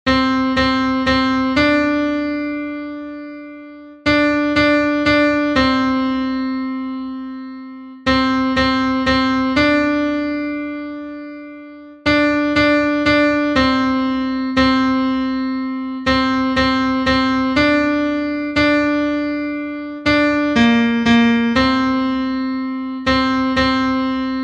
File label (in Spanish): contralto, himnos en piano; cantos del camino